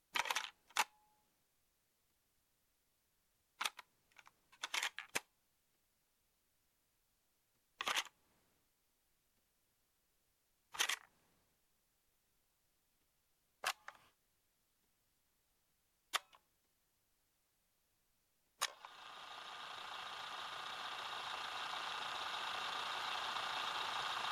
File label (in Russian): zvuk peremotki plenki kassety